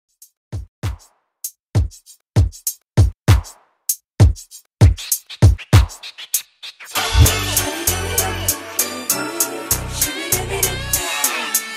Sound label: 90s Pop 98 BPM